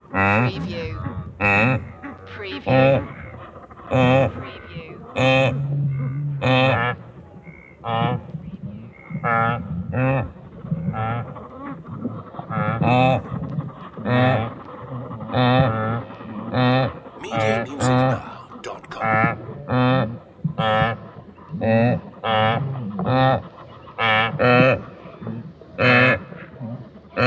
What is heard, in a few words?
Wildebeasts Grunting